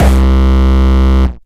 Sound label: Distortion Kicks, Bassdrums; Vengeance; Essential Clubsounds Vol.4